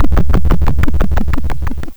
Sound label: ceiling fan